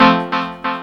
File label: PIANO FX; Ragga & Dub Reggae; PIANOS; AKAI; USB Soundscan vol.27